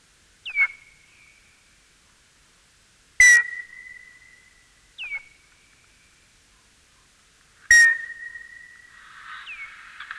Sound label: Nyctalus noctula